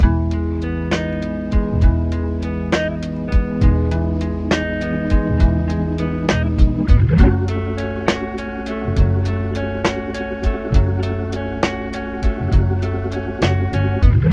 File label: full, Loops Phonky